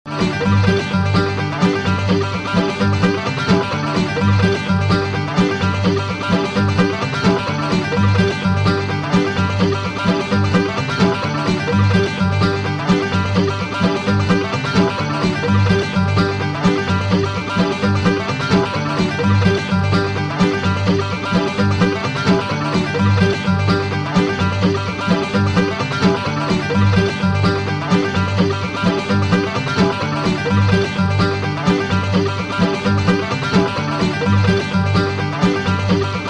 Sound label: lick loop, fiddle